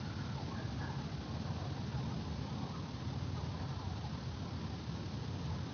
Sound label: Ambience Loop, outdoor